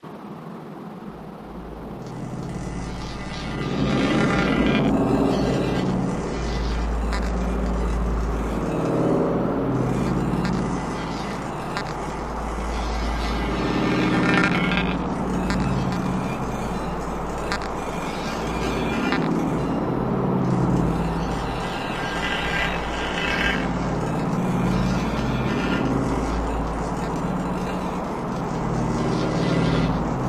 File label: Shatter Chunky Slw Shut, granular